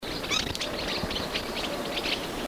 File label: Taiwan Yuhina, birdcall